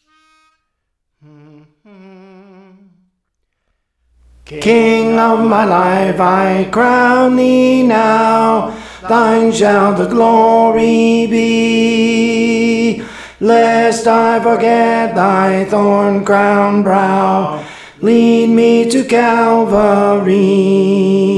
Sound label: Lead Me to Calvary (Tenor); Praise For The Lord Training